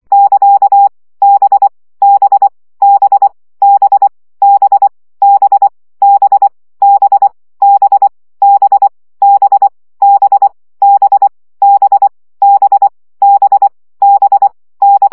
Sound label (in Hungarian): CW gyakorlas b 20 baud